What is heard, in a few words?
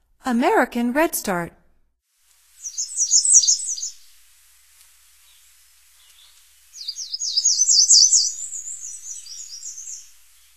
American Redstart, Songs of Birds of North America; Stokes